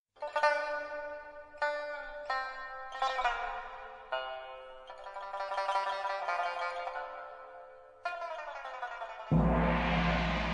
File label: arabian message; ringtones